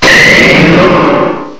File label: mega venusaur cries; direct sound samples